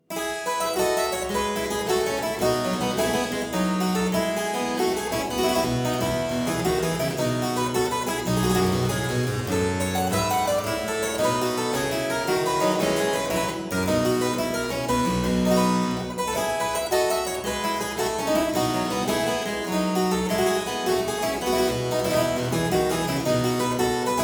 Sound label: Suite in F major Gigue, Harpsichord; Buxtehude; Complete Harpsichord Music